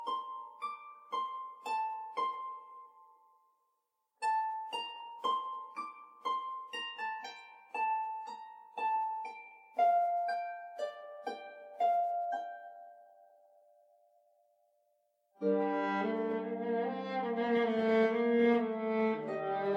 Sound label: viola